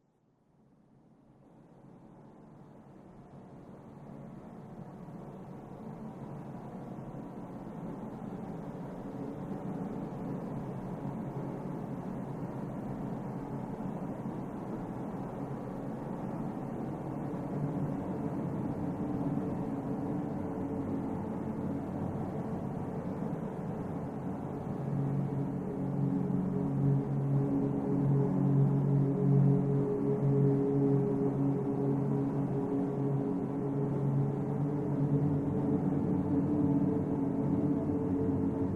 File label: inside soundtrack, credits